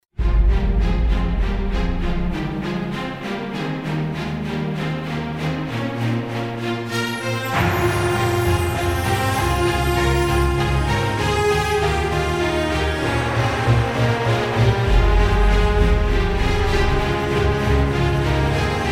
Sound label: Epic Trailer, motivational epic music